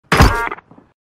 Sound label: enemy downed sound effect; warzone